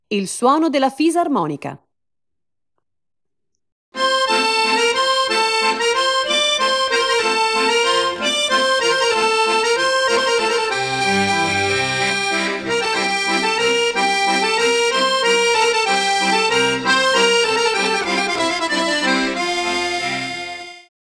fisarmonica

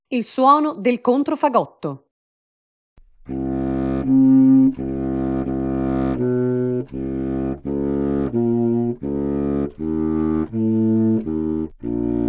controfagotto

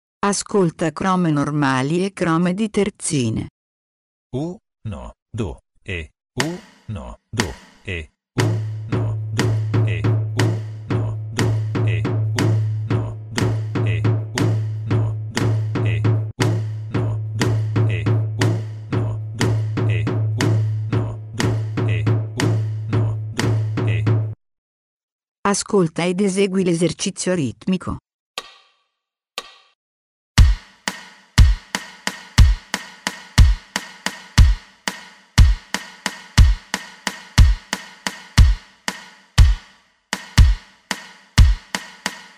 due esercizi ritmici con terzine

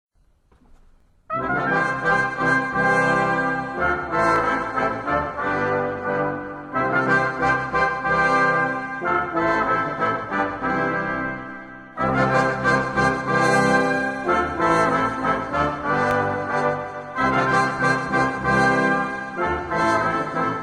danza rinascimentale; susato tielman

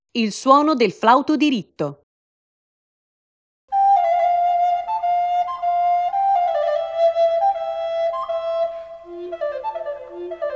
flauto diritto

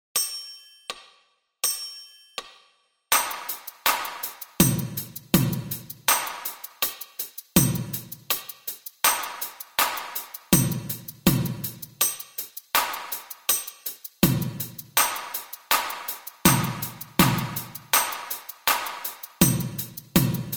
esercizio ritmico

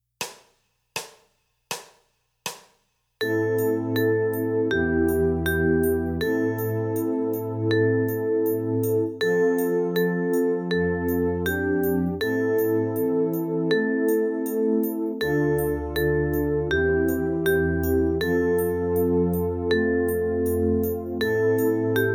la nota La3; piccoli passi

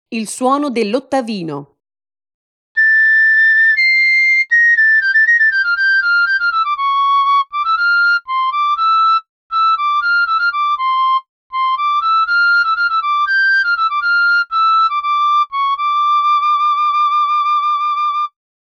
ottavino

